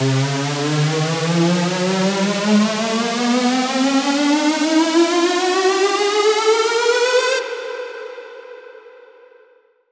Square C 4 Bars

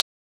Transient; FLPRO

